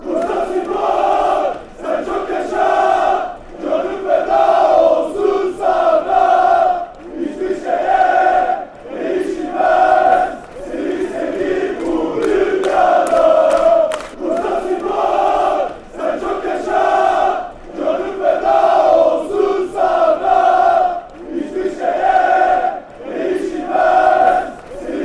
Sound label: live long, chants; bursaspor